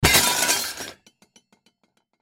Table Hit With Fist, Table Hit With Fist Metal Objects Rattle; Hits; Foley; Blastwave